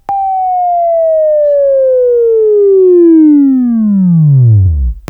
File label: WAV Right, Sweeping; Sweeping Training program